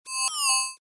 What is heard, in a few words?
Mail arrive, Mail Arrive: Alert To Indicate New E-Mail; Chimes; Multimedia; Stuart Duffield